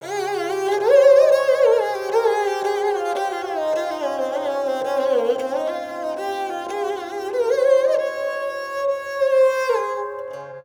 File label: Dilruba C 90bpm; EM Dilruba C 90bpm 01.wav